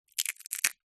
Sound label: food nut monkey nut shell crush; zapsplat